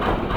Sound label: piston, cargoliner; Soldier Of Fortune II Double Helix; XBOX; ambience